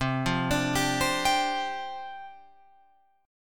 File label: C Suspended 2nd Suspended 4th, down, Guitar, Open F; Csus2sus4 arpeggiated